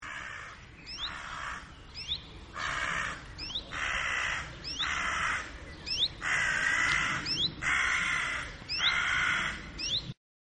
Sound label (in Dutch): Zwarte Kraai Corvus corone Geluid; Annelies van Klinken